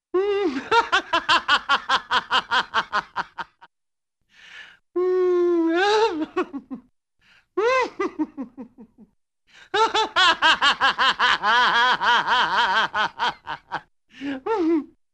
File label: Funny Snickering Man Laughs, Cartoon; Hollywood Edge; Cartoon Trax